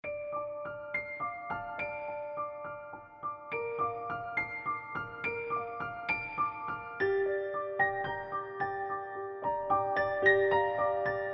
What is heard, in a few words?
Silent Night Piano Version